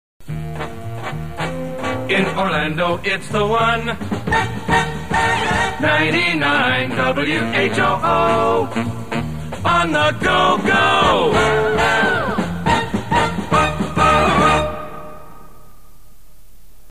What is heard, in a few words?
jingle; In Orlando Its the One